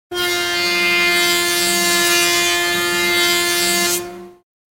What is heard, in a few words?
table saw; a dump in the dark